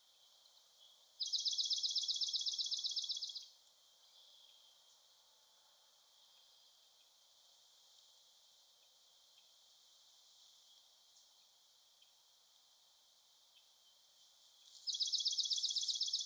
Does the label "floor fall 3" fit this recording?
No